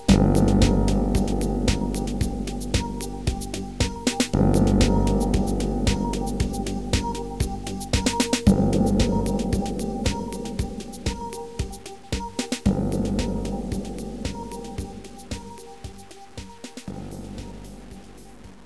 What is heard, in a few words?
intro music